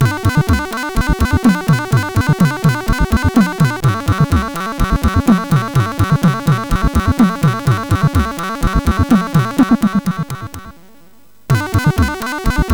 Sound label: Ingame Jingle, MOS6581R4